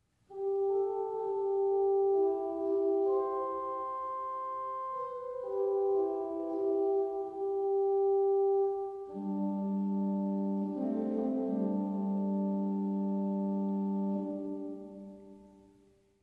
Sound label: French Horn